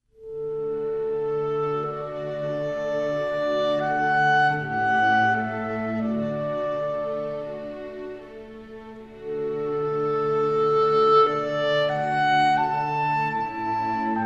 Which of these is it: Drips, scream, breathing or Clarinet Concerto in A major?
Clarinet Concerto in A major